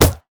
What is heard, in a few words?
minotaur basicatk; LoL; alistar